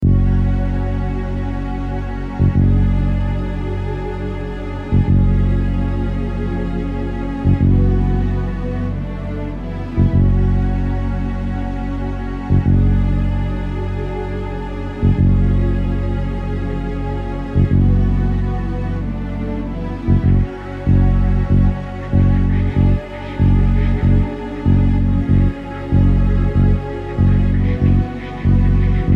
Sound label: Pre-Boss Battle Tension Loop; quest of dungeons original soundtrack